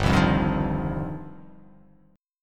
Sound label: G 7th Suspended 4th Sharp 5th